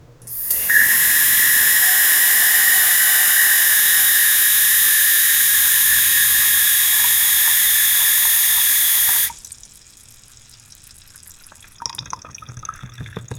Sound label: dishes being washed fauce